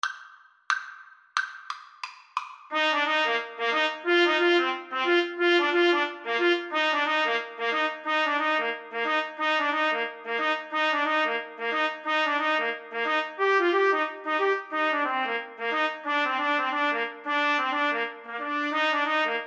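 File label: when the saints trumpet duet karaoke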